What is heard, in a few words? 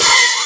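HH OPEN right; USB Soundscan vol.33; Disjointed Textures; AKAI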